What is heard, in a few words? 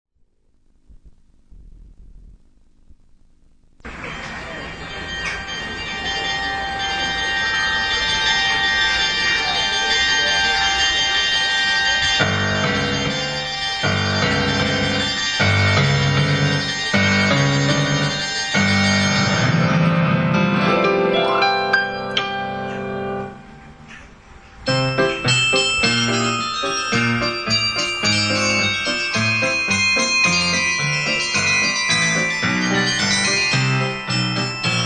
piano